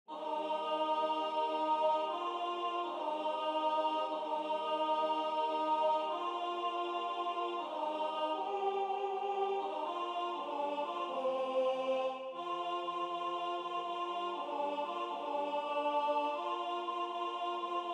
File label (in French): alto